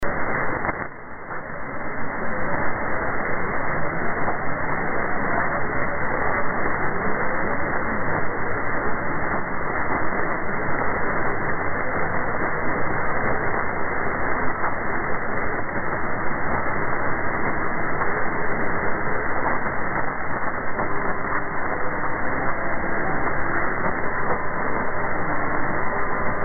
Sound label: Radio Bulgaria IS